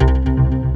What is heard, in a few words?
SFX, FILM SCORE; AKAI S6000 CD-ROM - Volume 1